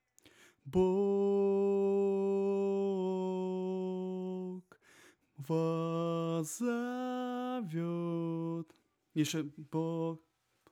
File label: alto